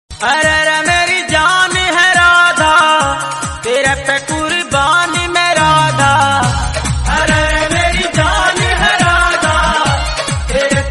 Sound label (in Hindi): radha krishna bhajan remix hindi